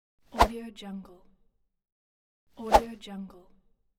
golf swing whoosh

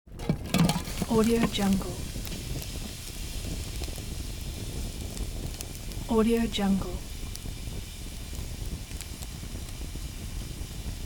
campfire coffee pot

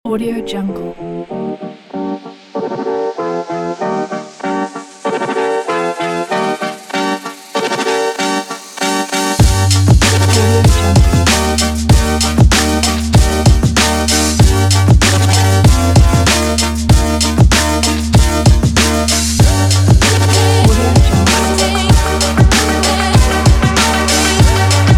summer future hip hop

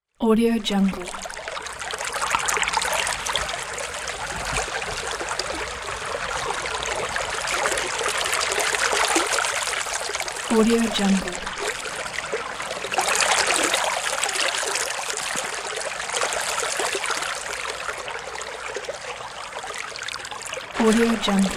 brook

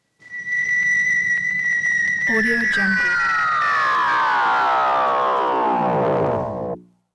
sting sci fi various tones

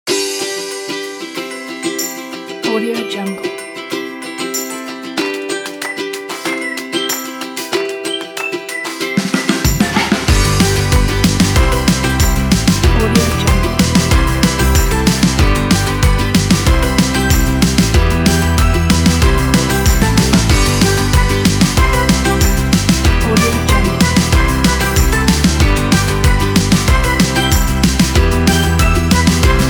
uplifting happy upbeat

